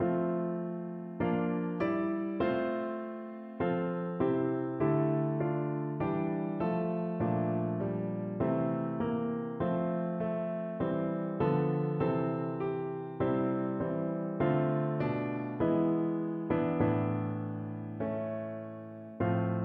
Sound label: organ